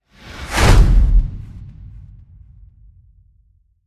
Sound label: Envelope Wipe; Ninja Tracks